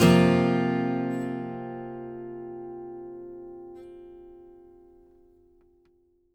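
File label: acoustic guitar F sus up, Whole Lotta Country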